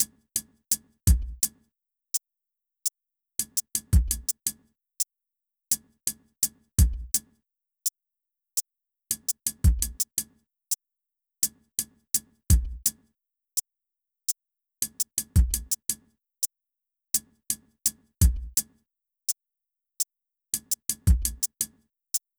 drums

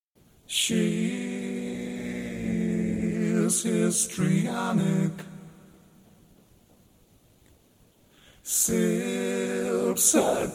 E120 Histrionic vox

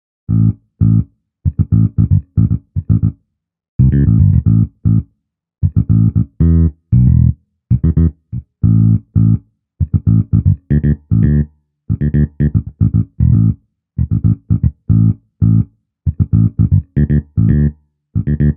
Blue Funk

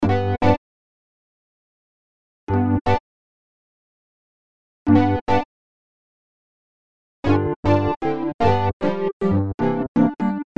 e piano loops

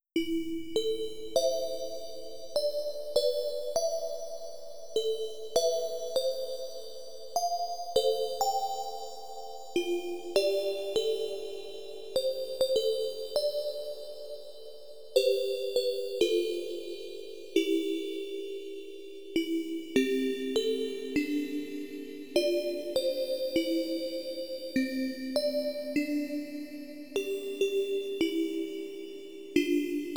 bell 100bpm C

